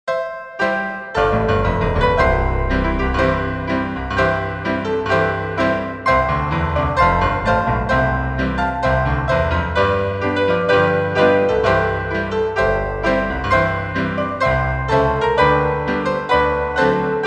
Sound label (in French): le signal de la victoire piano